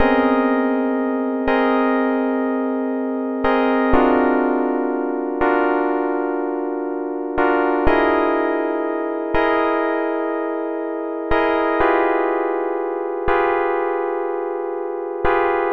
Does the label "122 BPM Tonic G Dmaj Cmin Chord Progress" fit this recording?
Yes